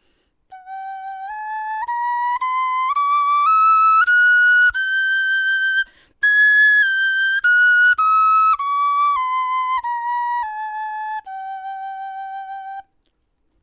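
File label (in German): tonleiter, Knochenflöten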